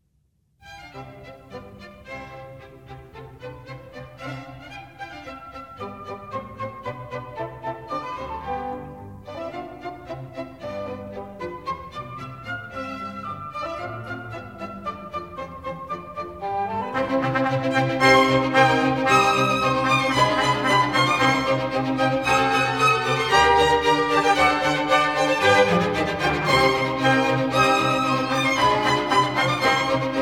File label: Contradanse in C; Mozart; Dances and Marches